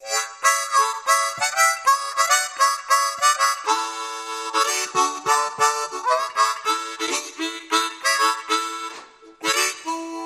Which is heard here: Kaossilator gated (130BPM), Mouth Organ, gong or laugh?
Mouth Organ